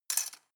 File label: cutlery plastic drawer place